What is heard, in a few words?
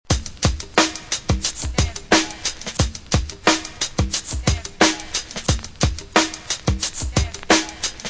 drum loops